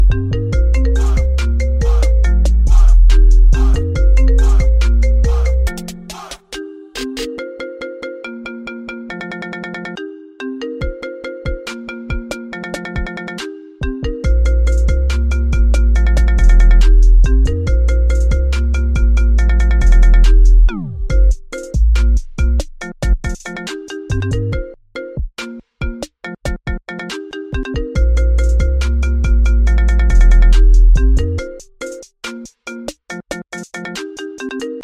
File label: iPhone 11 Trap Remix Ringtone